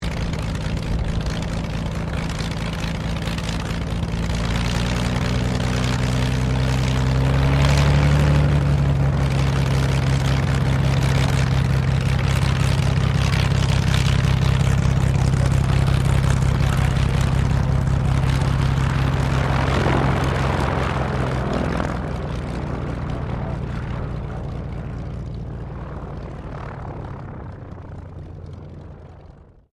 AIRCRAFT CT4A IDLE TAXI, RAAF CT4 training aircraft; Airplanes; Vehicles